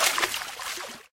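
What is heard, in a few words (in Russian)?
громкий всплеск воды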